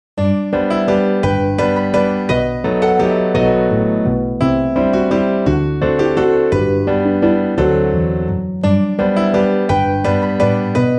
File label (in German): midi 3-4 klavier; so lumpen wie wir